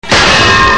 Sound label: anvil; Animaniacs